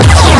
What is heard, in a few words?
EFire; Amiga Games 1996 #7; warstar